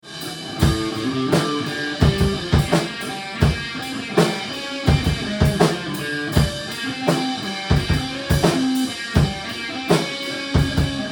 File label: Groovelicious, jam